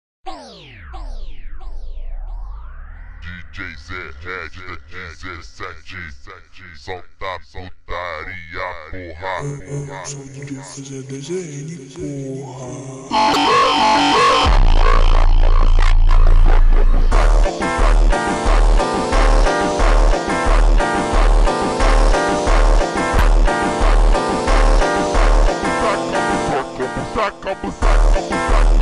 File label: slowed and reverb